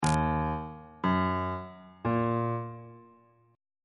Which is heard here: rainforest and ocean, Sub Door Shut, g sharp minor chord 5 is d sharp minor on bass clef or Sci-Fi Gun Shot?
g sharp minor chord 5 is d sharp minor on bass clef